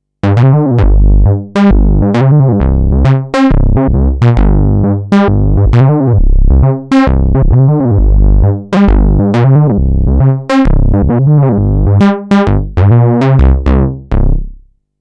Deep Bass

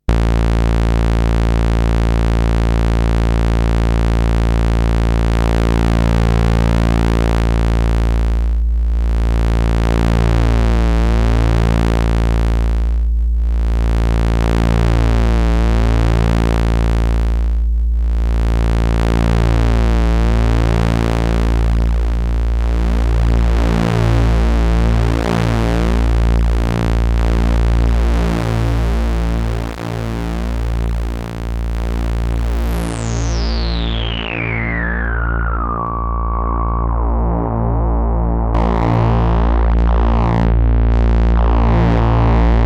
Wave Modulation

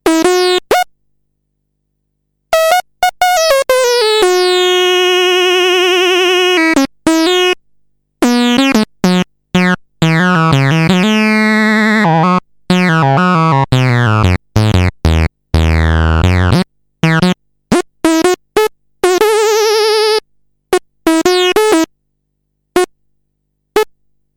acid saw

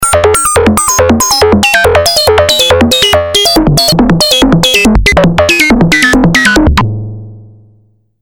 metal larp

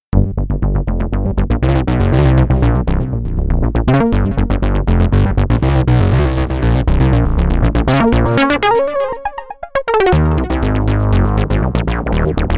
Paunch Bass

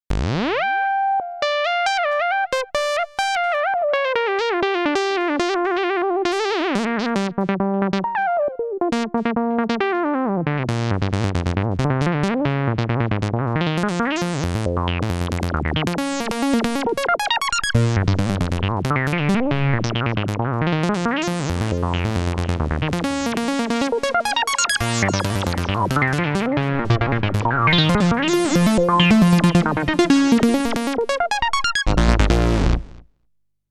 Hybrid Lead

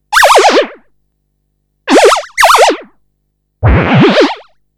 chirpo

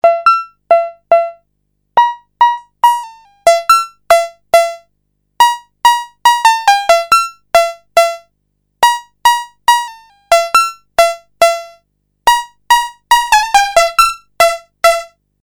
piano like

